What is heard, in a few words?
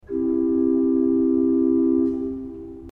II portun8 C; srednja vas